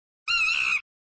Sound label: Sound Monkey scream; Monkey scream v1